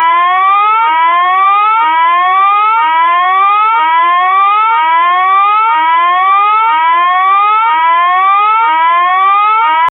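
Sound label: an emergency alarm emergency alert system so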